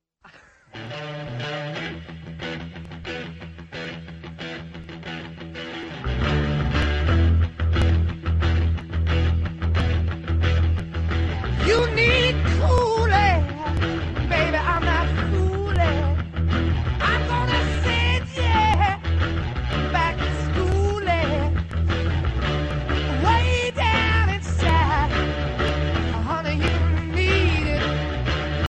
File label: iphone 7 rock ringtone